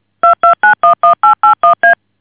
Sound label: DTMF